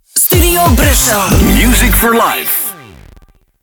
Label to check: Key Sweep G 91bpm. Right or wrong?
Right